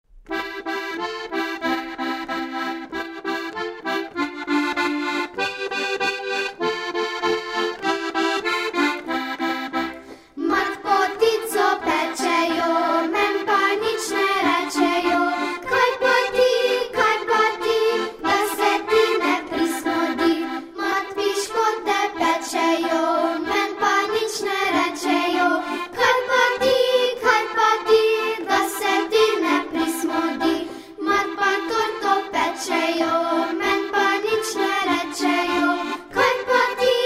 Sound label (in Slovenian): slovenska ljudska